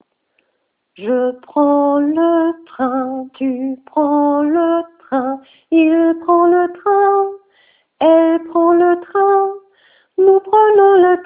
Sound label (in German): lied verb prendre; franzoesisch